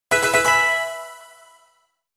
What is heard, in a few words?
Level Up!; Pokemon XY